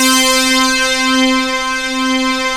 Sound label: POLY REZO C5; MOOG; USB Soundscan vol.49 - Analog Fantasies; AKAI